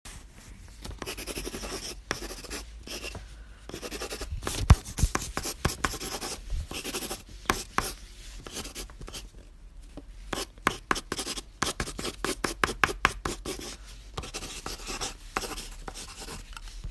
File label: Pencil